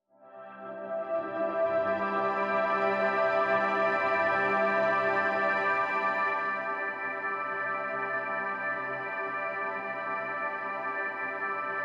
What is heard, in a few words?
ambient